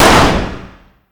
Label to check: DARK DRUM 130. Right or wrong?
Wrong